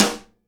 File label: SIDE STICK, Live Snares